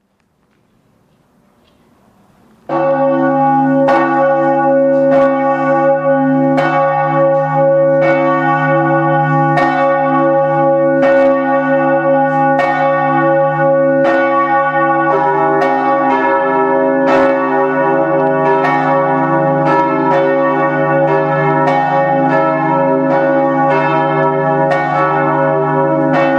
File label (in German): Glocken